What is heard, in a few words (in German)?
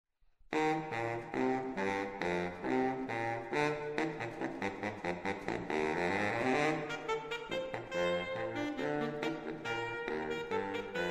Petersburger Schlittenfahrt DUO, saxophon